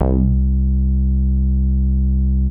basses

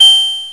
Hit; Isometric Game Programming with DirectX 7.0

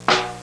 military drum hit; Sound Effects for Multimedia - Volume 1

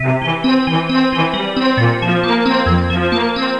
Lively Synth; Jim Heid’s Sounds

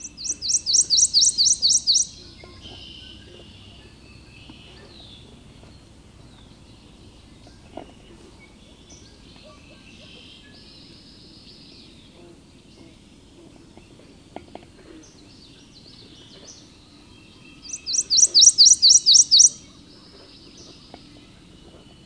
P Warbler, Birds, Coastal Louisiana; Explore Coastal Louisiana with Boudreaux

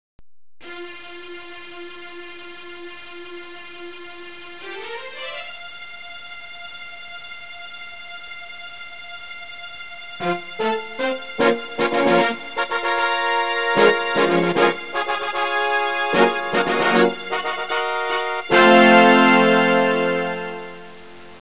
FANFARE

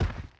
step; DAoC; Toa; Gamestar

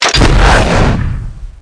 MISSILE

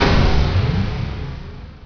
miss; Revista CD Expert 45; ZEUS

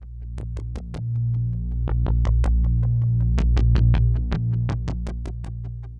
SNTH BASS AMBNT 160 C; Ambient

